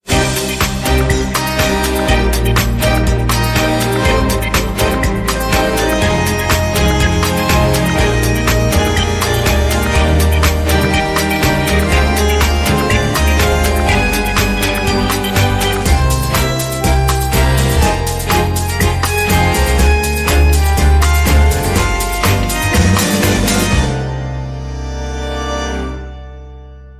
Modern Tango

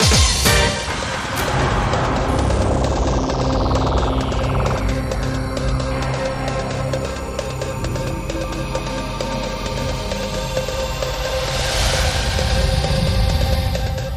Future News Bulletin

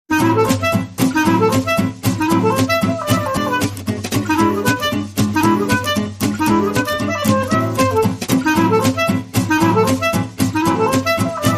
No Bass No Studio Noise

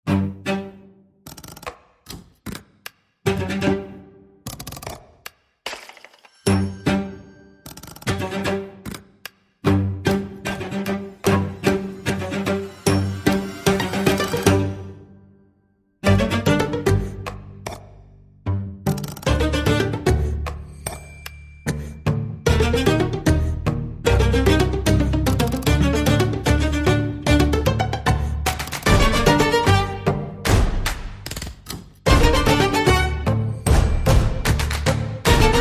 Light Percussion

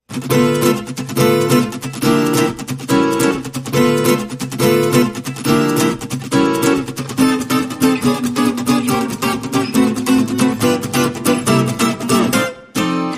Guitars

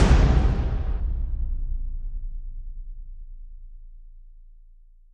Drum Hit

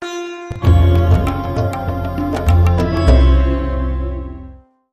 Weeping Raga, Hit

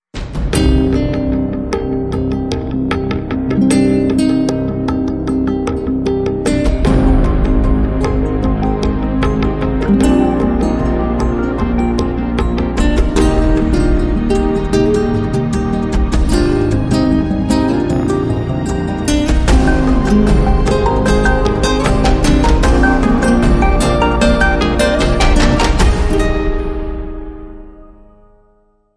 Cinematic

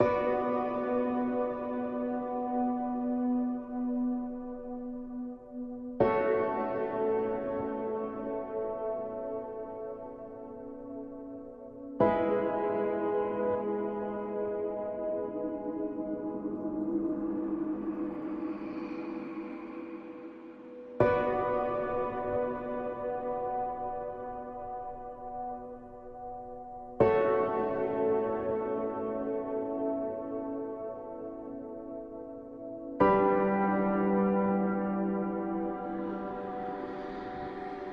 Piano and Pads

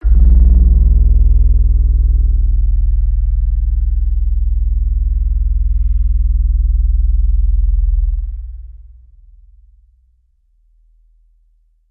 Sub Fltr Leveling

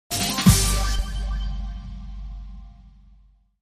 Correct Answer Level Up

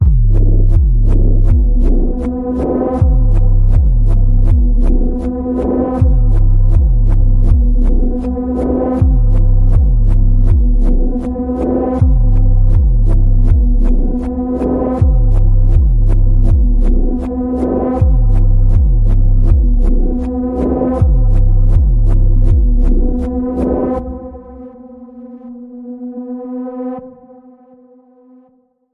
Panning Pulse B, Key of B

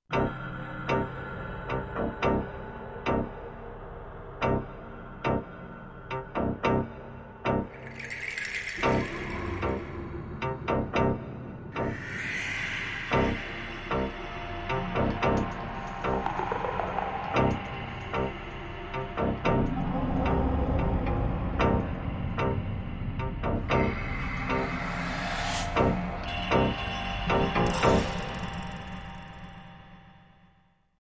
Dark Alien

